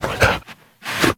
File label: Kubrow Tame Idle Alert Chew Foot A; Warframe